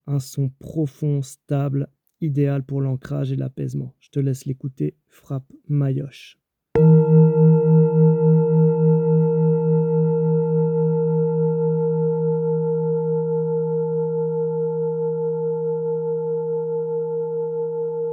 Bol Tibetain 7 Metaux Grave Om Mani Padme Hum Mi bemol; Bol tibétain 7 métaux gravé en mi bémol, décoré du mantra Om Mani Padme Hum et des huit sy

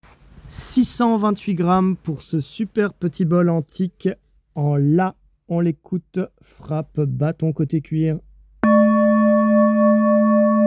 Bol Tibetain Antique Note La 16 cm

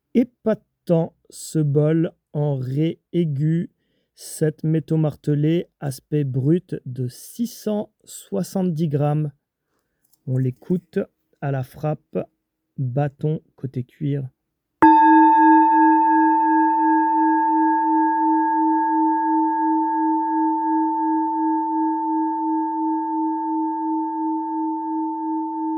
Bol Tibetain Martele Shinny Brut 7 Metaux Note Re Legerement Aigu

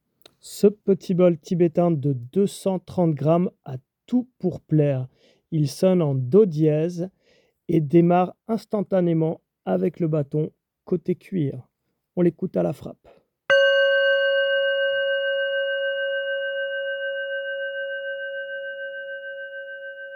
Bol Tibetain 7 Metaux Martele Matifie Note Do

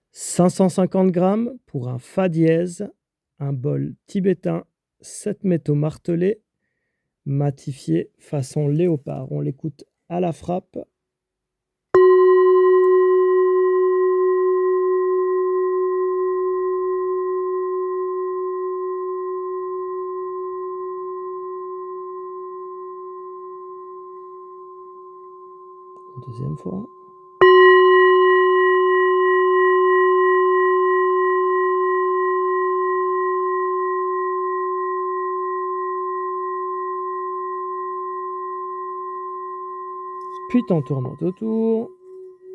Bol Tibetain 7 Metaux Martele Matifie Note Fa

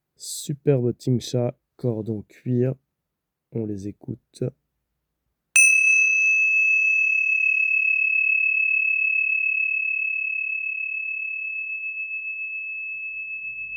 Tingshas tibetaines 7 metaux 77 mm Harmonie naturelle en Mi

